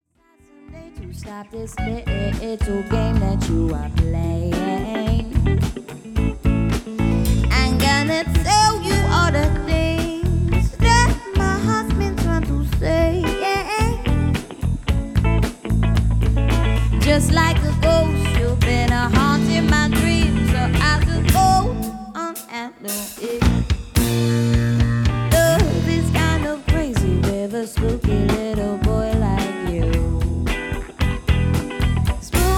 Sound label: spooky live